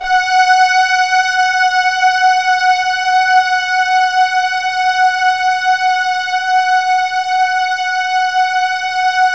Keypad; Standard Instr; Keypad 2

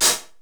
909 PHH, 480L; Wizoo; Platinum 24 Electronic; 480L BW 909

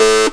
saw lead synth

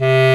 Clarinet & Bass Clarinet

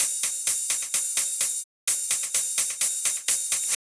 Loop Percussion, 128 BPM; VEC5 Drumloops; VEC5 Multi Drum Loop 28

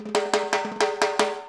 FILL, Percussion

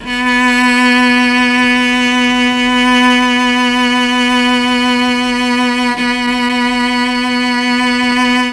B2 CELLO, Mellotron; CHMBLN CELLO; Mike Pinder - Mellotron Archive CD

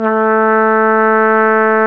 Solo Brass, Tpt p

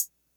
hihat; Big Fish Audio; Hardcore Breakbeat Action; Core 129 Cmin

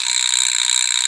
vibraslap; AKAI S-Series CD-ROM Sound Library VOL-5

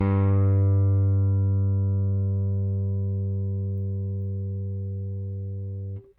RickThumb-B, RickenbackerBass; Airwindows

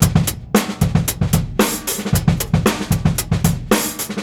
DRUM 113

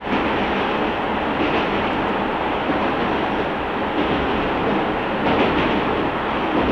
TRAIN; AKAI S-Series CD-ROM Sound Library VOL-6

